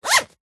foley luggage rucksack backpack zipper fast; zapsplat

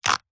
horror neck snap break twist; zapsplat

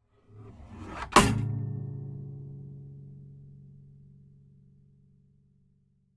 musical guitar acoustic slide against wall drop

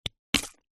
food salt mill plastic lid remove; zapsplat

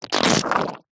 cartoon squelch

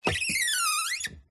Wood Stress Creak Squeaks; DPA4060; pmsfx

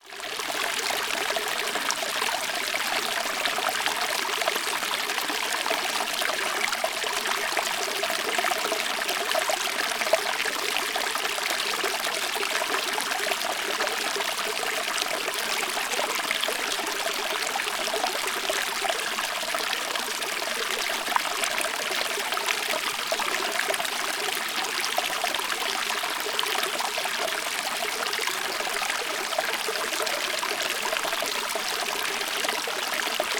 nature waterfall small from large

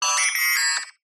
science fiction robot android beep chirp classic

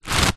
rope stress tighten creak fast; foley; zapsplat

